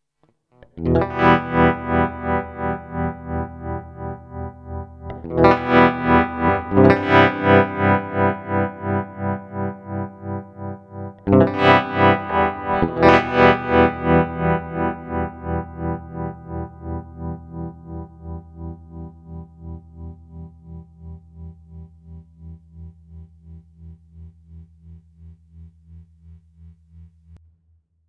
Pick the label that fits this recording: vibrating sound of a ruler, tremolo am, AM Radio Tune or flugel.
tremolo am